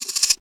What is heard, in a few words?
Warrior Attack Miss; we are the dwarves gamerip 2016